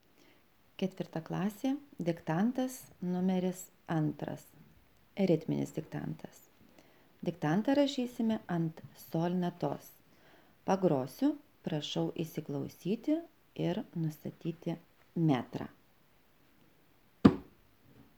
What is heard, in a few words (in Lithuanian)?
ritminis